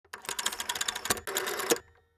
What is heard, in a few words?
rotary phone